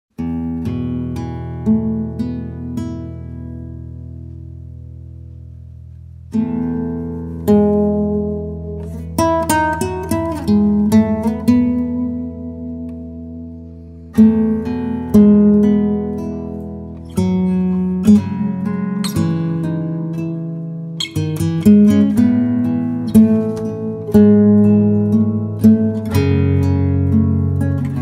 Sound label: Peaceful Guitar